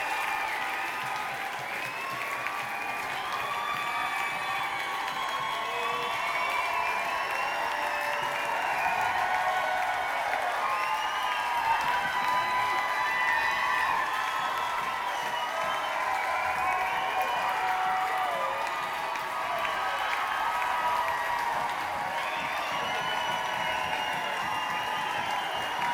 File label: applause; starland ballroom - sayreville, new jersey